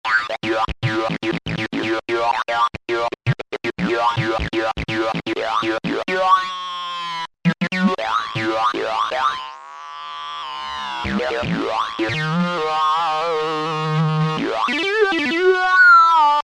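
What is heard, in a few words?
Lead HPF Attack; Factory presets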